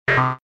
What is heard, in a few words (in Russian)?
Звук ошибка в доступе